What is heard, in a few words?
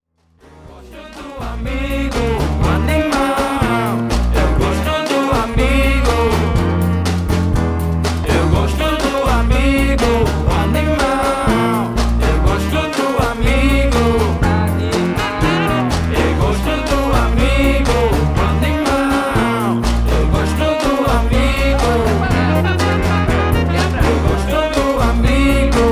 batucada jazz